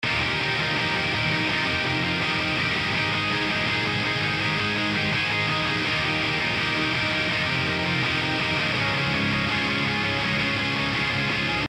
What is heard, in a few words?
guitar after; puigtech